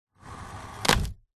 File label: polystyrene thin sheet slide on concrete then snap; foley; zapsplat